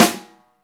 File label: SNR, Snare; Club-50 - Foundations Roland; Ext.Snare